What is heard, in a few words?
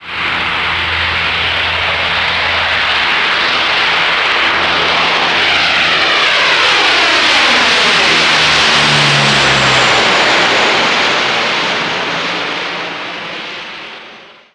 lockheed electra; robin pinnock; simutrans; Pak128.Britain-Ex